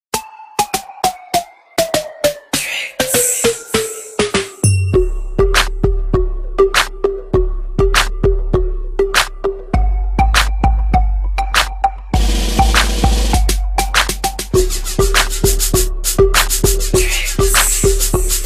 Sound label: Catchy Beat